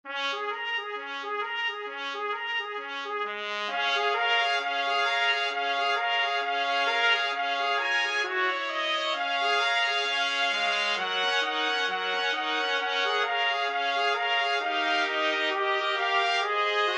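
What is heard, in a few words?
boogies, 4 trumpets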